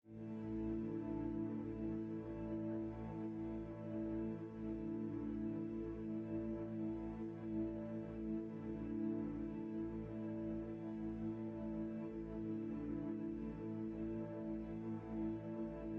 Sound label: Cave Music, Tree Lit